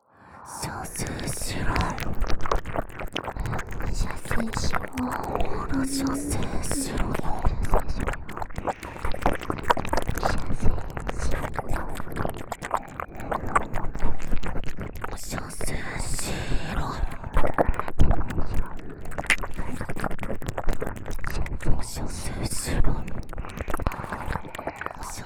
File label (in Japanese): 射精しろ耳舐め; 右ソフィ左セシリア